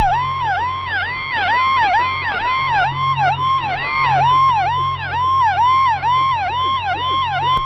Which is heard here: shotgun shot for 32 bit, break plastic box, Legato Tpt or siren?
siren